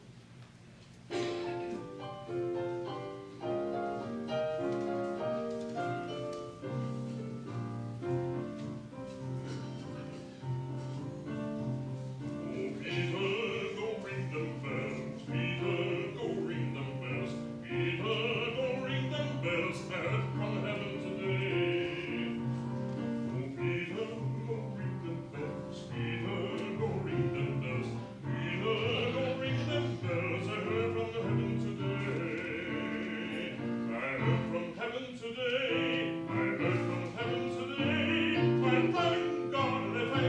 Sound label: Oh Peter Go Ring Dem Bells